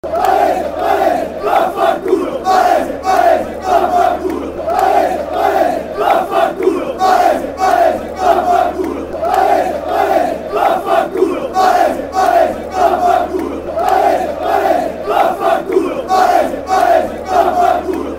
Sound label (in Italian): Varese, cori curva nord